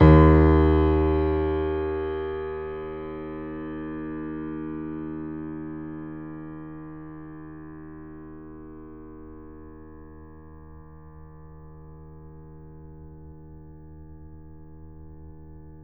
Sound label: Grand Piano D#1 f